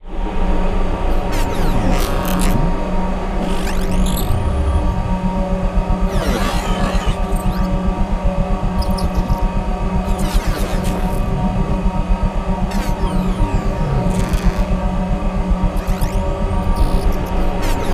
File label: ambient port; World Of Warships; port3d